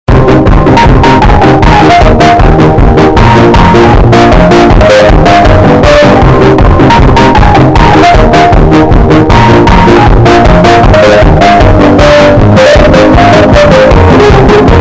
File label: bad piggies theme earrape low quality